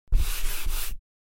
Chalk erasing on a chalkboard

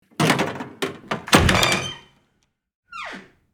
Cinematic door and lock open; household

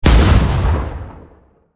Armored tank cannon fire single shot

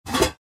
Cooking pot lid open; utensil

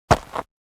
Footsteps single step on sand with pebbles

